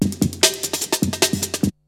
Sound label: BREAKBEATS, TECHNO